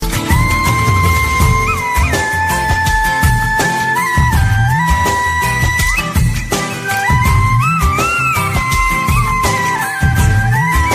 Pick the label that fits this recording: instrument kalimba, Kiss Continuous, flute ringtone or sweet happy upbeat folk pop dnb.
flute ringtone